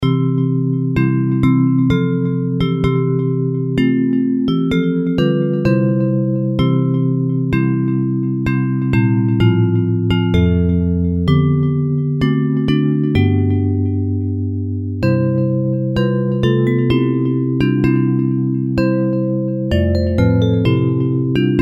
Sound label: Bells; Hymns